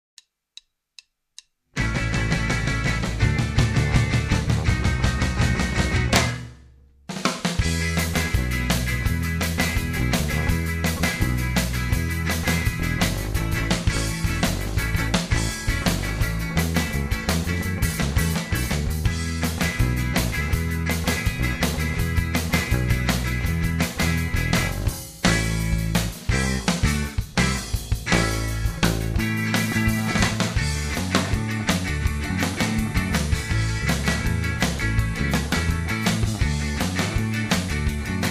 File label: backing